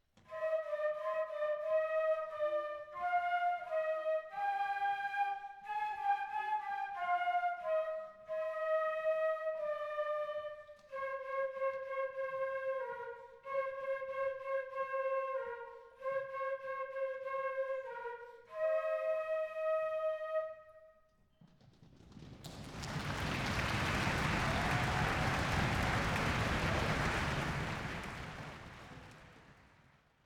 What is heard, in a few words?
Flutes